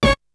Hit; ZERO G - Datafile DISC 3

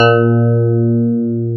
PIA DX E-PIANOS

